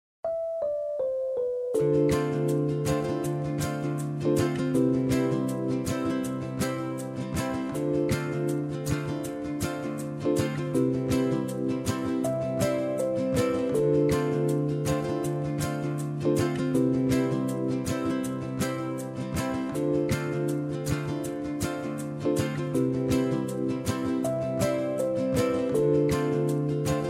Ukulele song

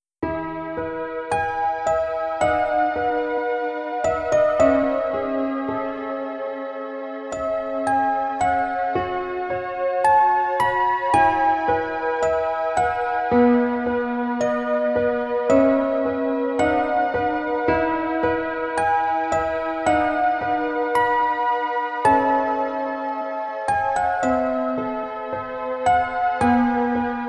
spa music 432 Hz; uzdrawianie miloscia

